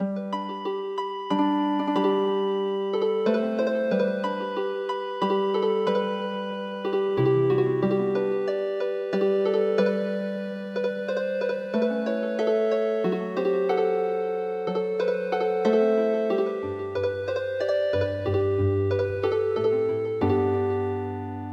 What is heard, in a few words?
Healing Harp